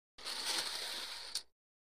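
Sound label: Poker Chip Movement; casino games; chip mvmt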